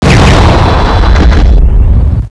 sentry fire

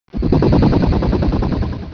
alert; svencoop; portal house

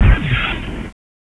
glassdoor; dod; ge aztec

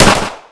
big gun, ambience; gearbox

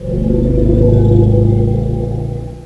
grrr; svencoop; russian sounds

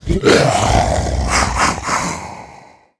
spawn

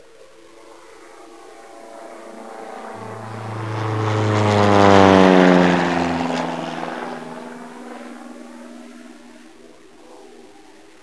plane

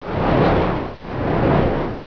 fan

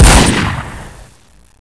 gun, weapons

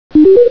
pong score; tfc

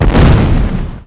nature

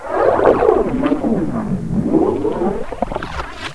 pit drone eat; svencoop; cryocell

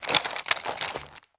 gun pickup; gearbox

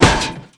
armor; svencoop; aliens items

